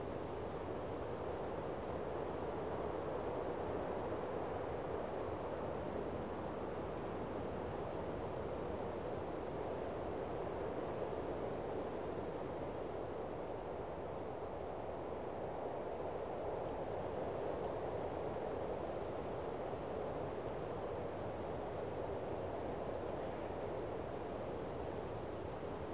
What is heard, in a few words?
WIND LIGHT; YBR125